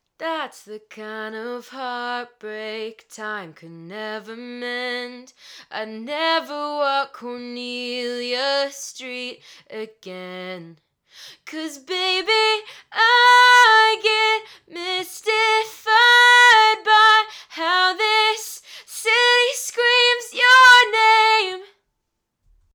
Movo UM300 cardioid singing microphone sample

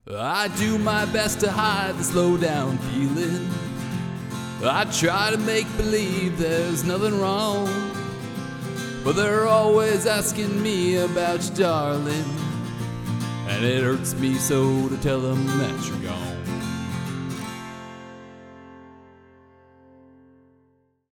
Acoustics and Vocals; M-TRACK DUO HD; producer pack

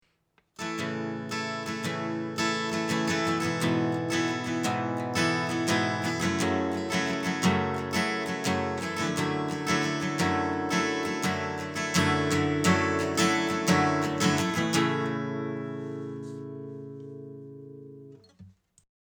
acoustic guitar; Movo UM300; cardioid

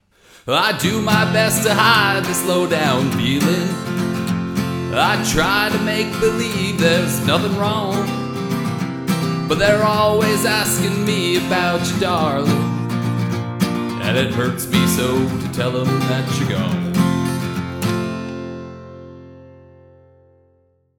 Acoustic and Vocal; AKG P420

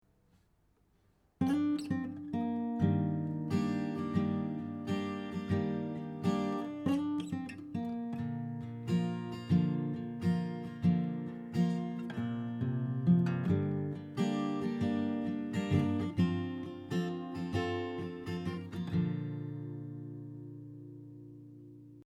acoustic guitar; JLab Talk PRO; stereo

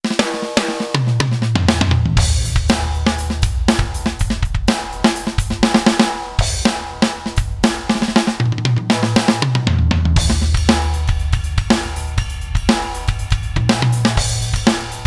Kit Test; alesis strata club; Binary Cleanse

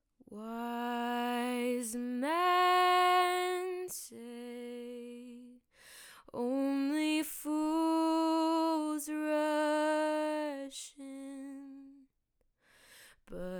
Audio Technica AT2020 singing sample

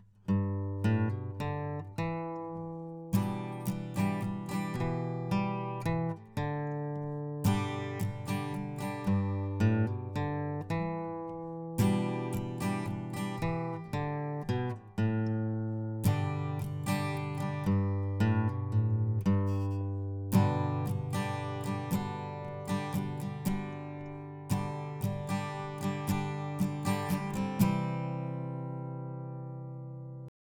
AT2020 Acoustic

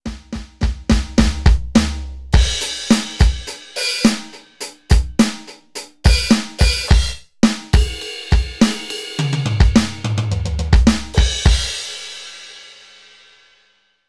Pop kit drum test; Donner DED-200

